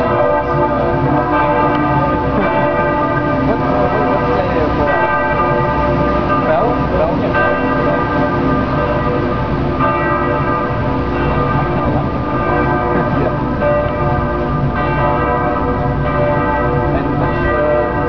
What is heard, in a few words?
churchbells